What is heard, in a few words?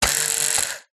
household razor electric male motor whirr close up open short burst; remington